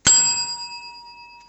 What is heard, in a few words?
bonus